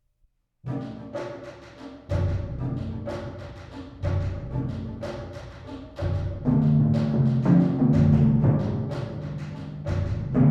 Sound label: Concerto For Timpani Allegro A La Afro Cubano